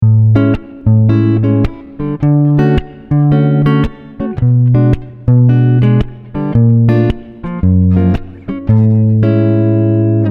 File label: Boss GT-10 JC-120